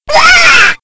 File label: Scream; Sword God